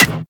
Melee Staff Impact Generic; Staffs

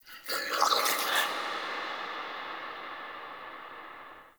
Under City Small Creature

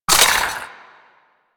Mech, Weapons Cannon; Kuva Zarr

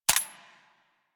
Rifle Mech A; Sundial

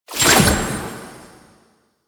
Rune Pickup; Warframe; Entrati; Mirror Defense

